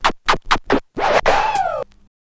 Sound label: scratch short, Vinyl scratches